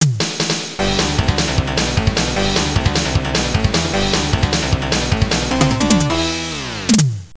Game Over; Naious; X68000

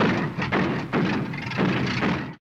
Transport Break; virtual city windows gamerip 2009

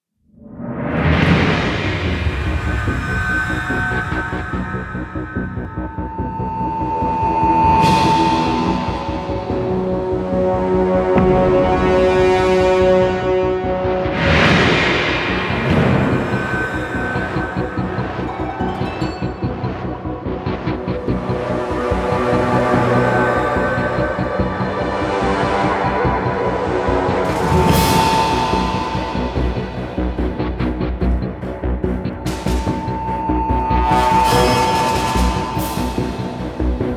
Combat Dogfight; Elite Dangerous

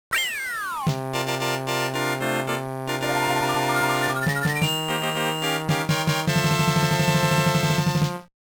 Fortress Clear; Super Mario Advance 2; Super Mario World

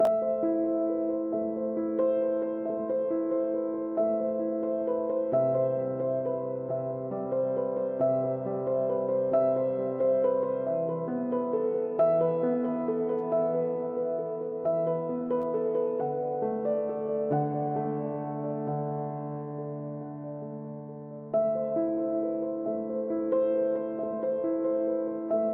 Piano; King Layers

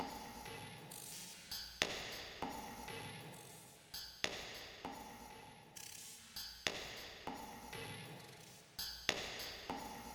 Explore Drums Stem

